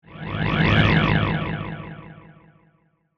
Transporter, Scifi, Transporter, Rematerialize, Science, Fiction; Spacecraft